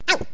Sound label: auw; Raft Wars